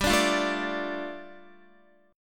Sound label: G 7th Suspended 2nd, Guitar; G7sus2 strummed